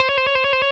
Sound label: trill